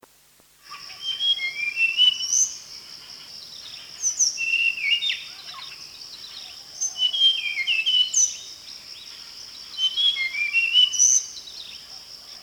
sikatan bakau

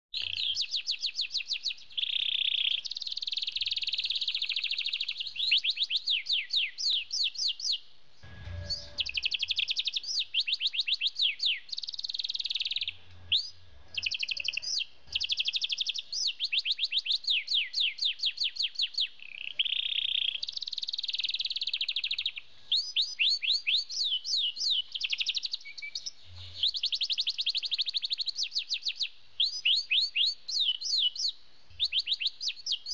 kenari australian plainhead

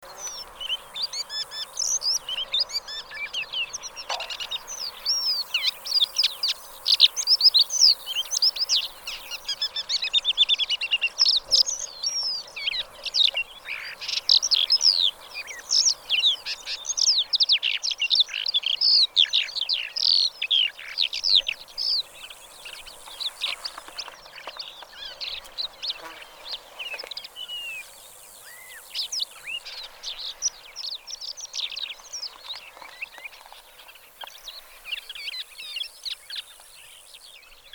suara black lark

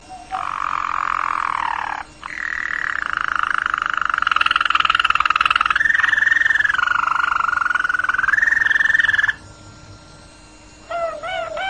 kenari roller

